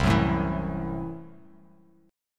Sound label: C 6th Flat 5th C down